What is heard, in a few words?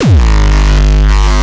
kick; shock. Ultimate Kicks vol. 2